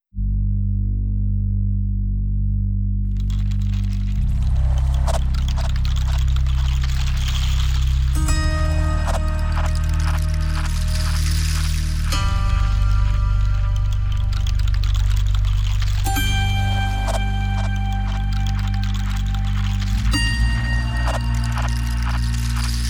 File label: Mysterious Zither